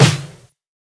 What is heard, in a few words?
boom snare single hit c key